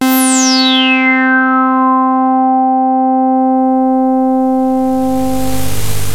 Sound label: 303 FILTER, Transwaves; Soundengine - CSR-000 The Art of Transwaves